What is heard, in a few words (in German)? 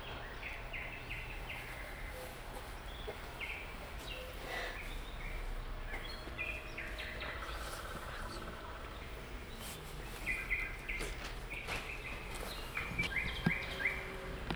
Geräusch